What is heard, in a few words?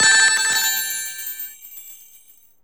SE GAME BREAK; maimai